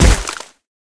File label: debris fall; Golden Crayon Sounds